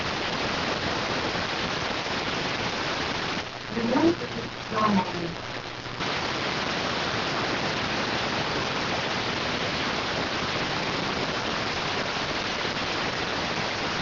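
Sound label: radioteletype receive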